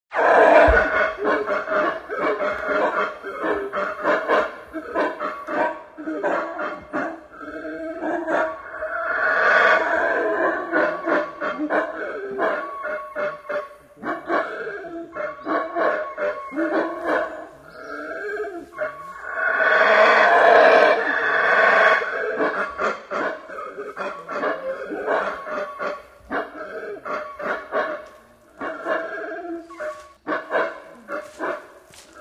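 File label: gorilla scream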